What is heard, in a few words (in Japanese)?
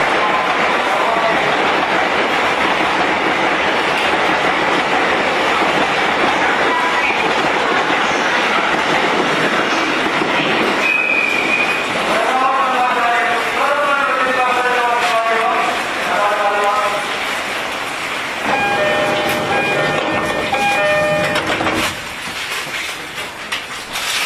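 E231系 発車メロディなし, 走行音; 池袋-赤羽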